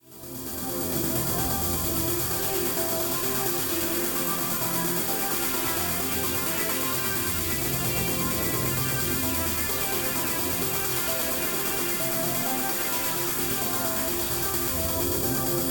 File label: Bus Music; gamerip; door to door